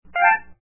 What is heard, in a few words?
peasant scream; trogdor canvas